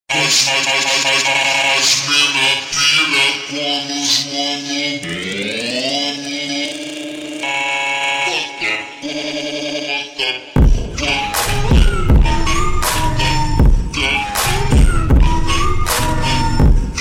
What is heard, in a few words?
Montagem slowed reverb